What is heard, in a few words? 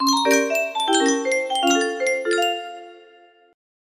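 nokia ringtone, music box melody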